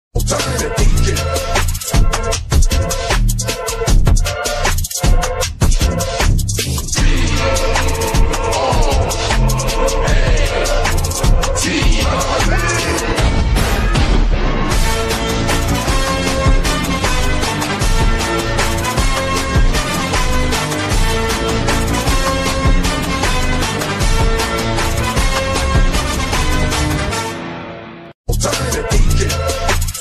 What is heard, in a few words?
movie bgm ringtone tamil ringtone